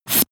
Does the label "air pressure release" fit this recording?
Yes